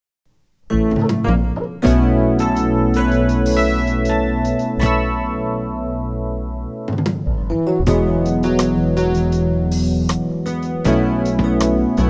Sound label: Music Only